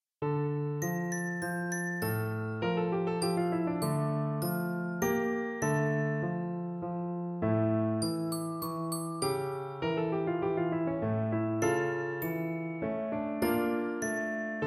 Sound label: Daydream Piano and Bells